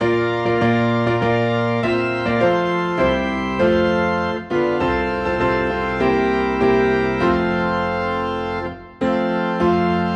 Piano and Organ in F; A Shelter in the Time of Storm

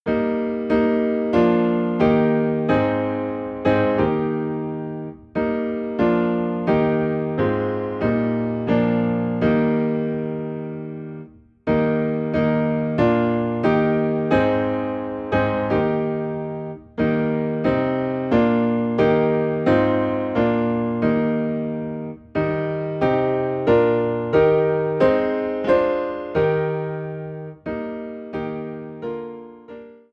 Piano in E Flat; We Believe In One True God; Played on a perfectly tuned piano by a church pianist in hymnal style

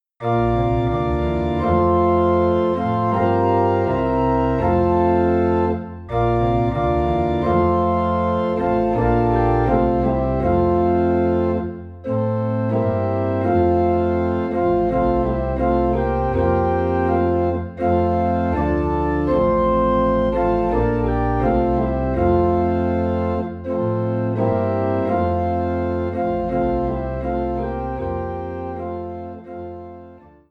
Organ in F; Once in Royal Davids City; christmas

